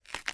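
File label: Movement Crunch; smite; god bakasura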